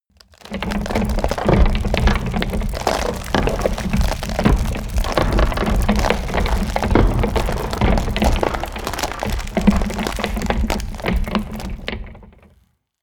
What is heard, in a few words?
ice medium pressured